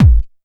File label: kick dance, techno trance drums; Techno Trance Essentials